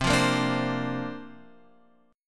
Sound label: C 9th Suspended 4th C down Guitar Open F; C9sus4 strummed